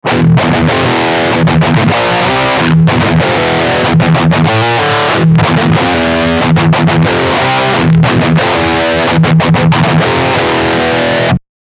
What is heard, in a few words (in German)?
esp ltd ec-401 riff steg pickup